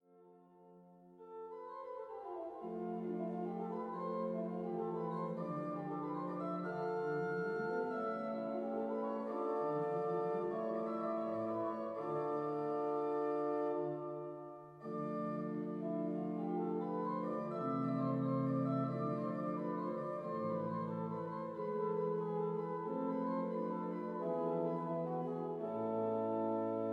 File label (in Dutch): Hinsz-orgel klankvoorbeeld; Appingedam